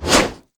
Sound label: basic attacks; Vi